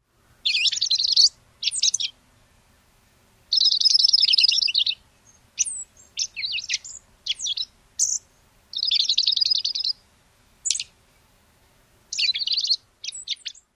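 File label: Dark-eyed Junco spring warbling song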